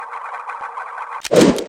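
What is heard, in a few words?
guns away; dmc-dante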